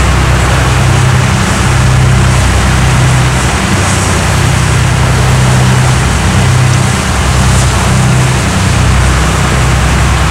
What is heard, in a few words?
boat engine; War Thunder; engines